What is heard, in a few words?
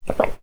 Drink